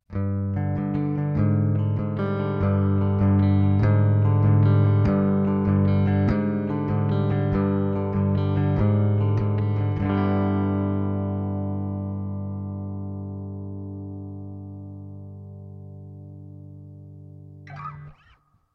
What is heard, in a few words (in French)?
clair arpege; arpège son clair